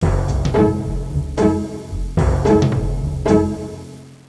timpani

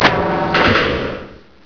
tug grab; acdemo; AC Demo 3-14-98 RipCord

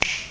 Snap Fingers; iPoker 2000

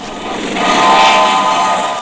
Choo Choo; Thermo; Chemistry

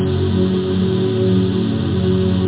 chord haunted string minor; OctaMED SoundStudio V1; strings

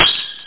tam; OctaMED SoundStudio V1; drumkit

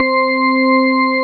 Hammond Organ (C4)

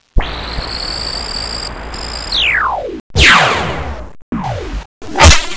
laser; XMEN2

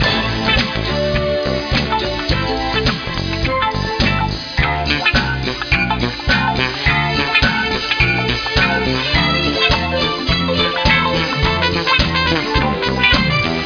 disco baby; Star Wars DroidWorks

